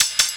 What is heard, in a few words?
Drum & Bass, 160 BPM